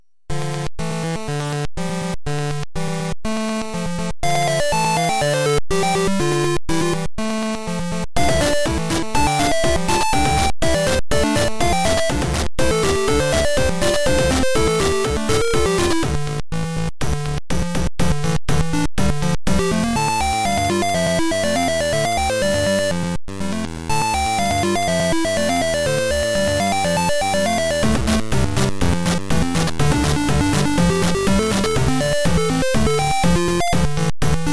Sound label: z80 TI83p raw